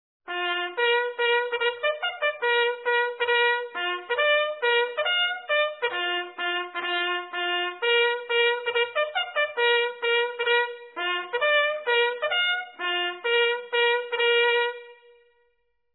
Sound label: Bugle Call General Salute